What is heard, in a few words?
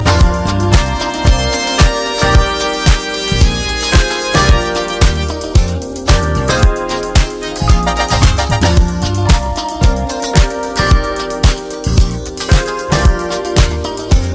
Instrumental